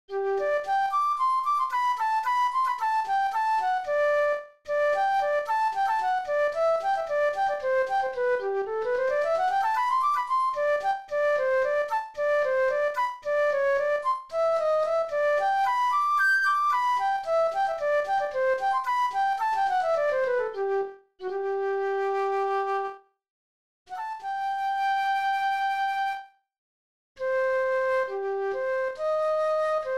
jr flute

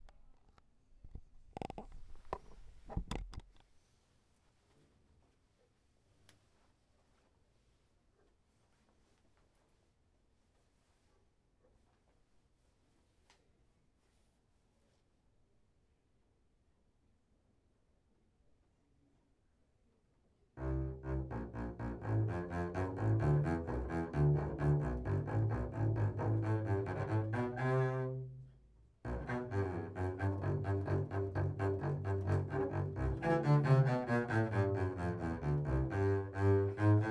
Witches round dance; Symphonie Fantastique

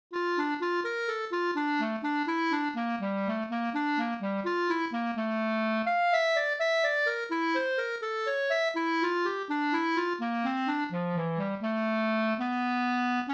Jr Clarinet

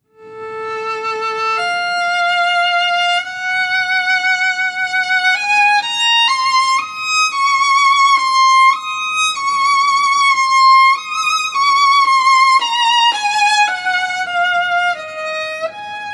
Violin; All-West 2019; Mahler Symphony No. 1

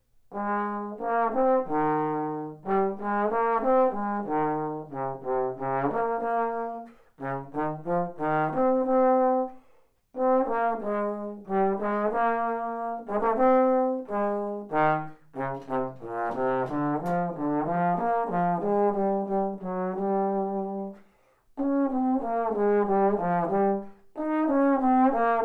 jr trombone

